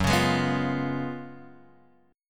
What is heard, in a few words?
F sharp Major 7th Suspended 2nd Suspended 4th F sharp, Guitar Open F; x,1,1,3,2,0; strummed